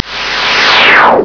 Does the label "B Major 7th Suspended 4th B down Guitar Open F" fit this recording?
No